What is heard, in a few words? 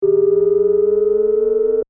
Scanner Beam Ray, Scifi; Science, Fiction; Tech Sound Effects; BlastWave